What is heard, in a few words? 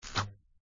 shoot; ArcherWorrior